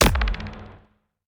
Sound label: dark mag